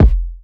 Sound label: boom kick sound f key; kick drum samples